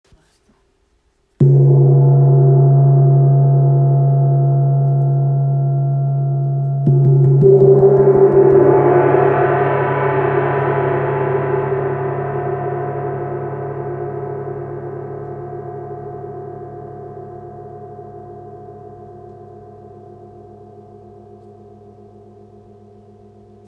Wind gong si 55cm

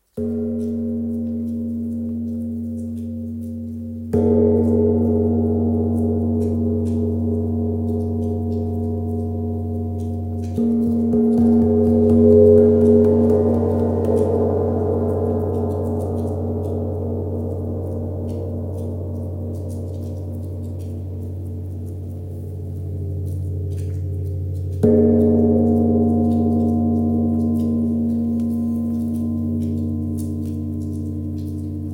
Wind gong 60cm fa diese

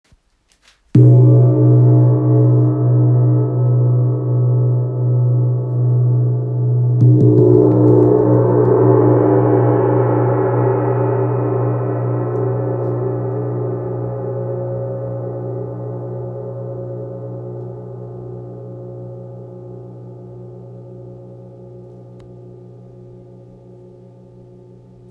Wind gong do diese 55cm

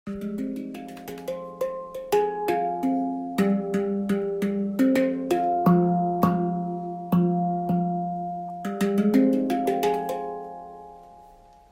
9 notes fa low pigmy

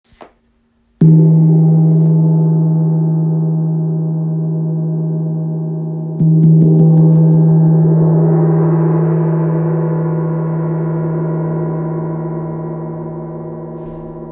Wind gong re diese 55cm

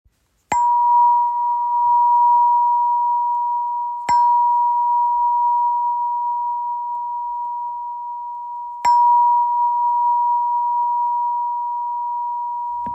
Wahwah; couronne; Gongs

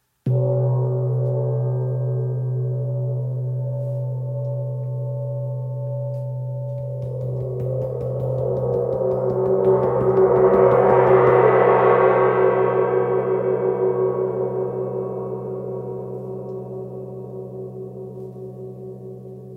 Wind gong 45cm do

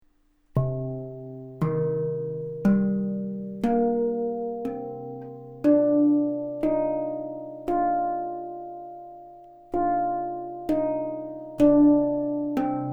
spacedrum 9 notes deep sky 432Hz

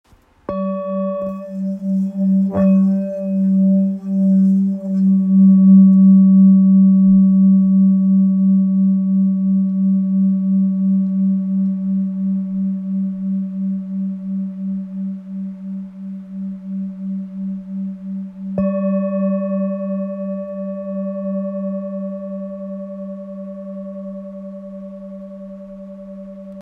Full Moon Sol

